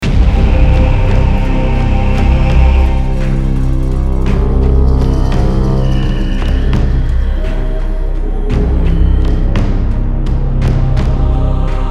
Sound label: Evil Fantasy Loop